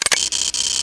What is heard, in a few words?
auto firing launcher; night watch